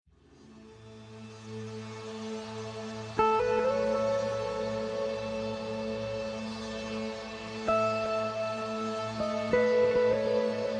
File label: Soothing Music For Acupunture; Spa